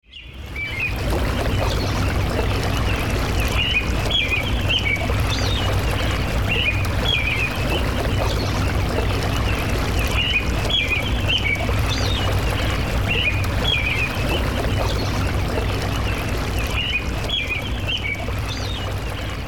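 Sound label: ambience hitchhike; Wario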